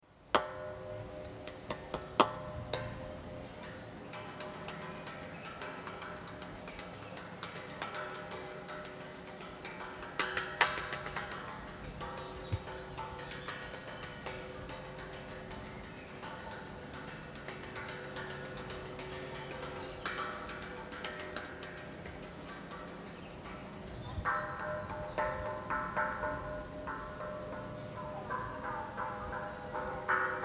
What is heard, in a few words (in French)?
Cloche Japonaise; Hanbury